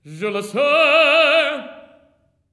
TEN. TRAD; Spectrasonics; Symphony of Voices

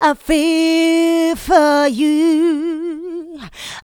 VOCA, Houseworx; Ueberschall; Workz-5

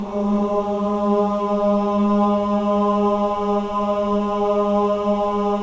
choir "ah" G#3; Spectrasonics; Symphony of Voices

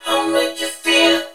MAKE U FEEL, VOCODER, Funky Vocals; USB Soundscan vol.18; AKAI

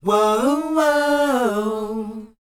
WHOA A, Vocal, R&B & Dance; ILIO; Vocal Planet

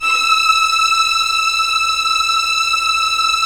STR Violins, Roland LA Composer; Roland - LA Composer vol. 4

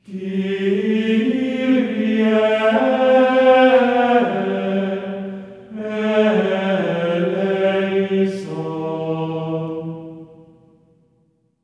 KYRIE A4, voices; Spectrasonics; Symphony of Voices; GR KYRIE

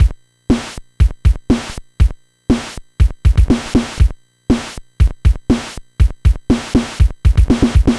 NES Drum Loop; Nintendo Entertainment System; Drums; Chiptune; Sonic Foundry (Sony Creative Software)

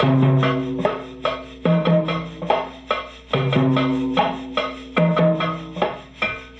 Chamberlin Rhythmate Loop, Drum Machines; Chamberlin Rhythmate Sample Pack; 300 Drum Machines

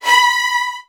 B3, VN 16TH, Virtuoso Strings; ILIO; Kirk Hunter

